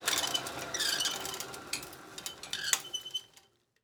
engine stop, sci-fi sounds, mechanical

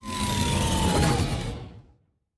Door, Sci-Fi Sounds

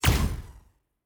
Weapon Shoot

